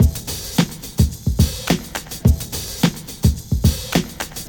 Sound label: 107 bpm breakbeat d sharp key